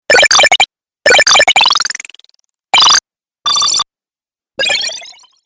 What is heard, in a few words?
Game Console Arcade Power Up Collection; Video Game Sound Effects